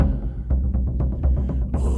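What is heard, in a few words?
Music Shield; from dust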